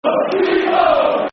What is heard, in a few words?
Football Chants; Sebo; Rangers GRFC